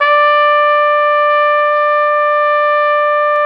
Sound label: Flugelhorn, Solo Brass